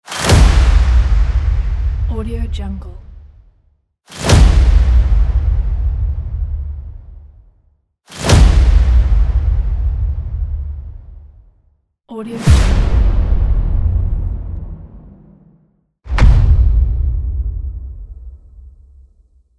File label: epic impact cinematic